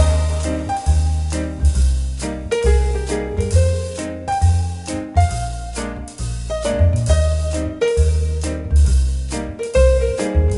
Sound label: Big Band Music